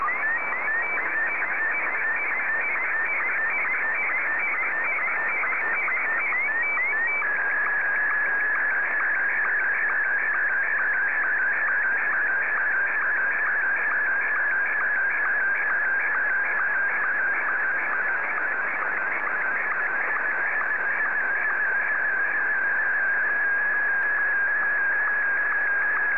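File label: SSTV, Martin 1; ZL2TJM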